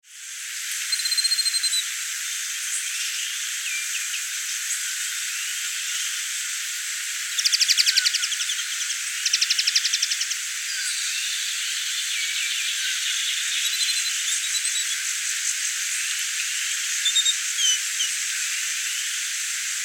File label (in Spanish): Pachyramphus cinnamomeus Anambé Canelo